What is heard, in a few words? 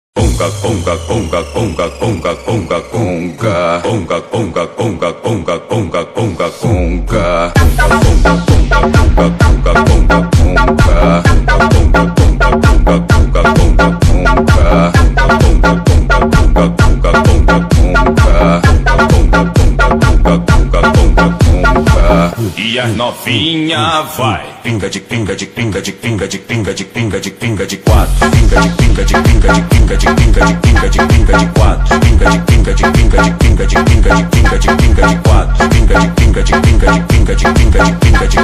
tiktok remix